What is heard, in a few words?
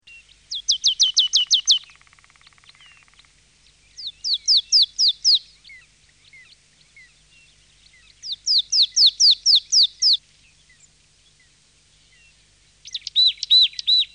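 Marsh tit; Madarak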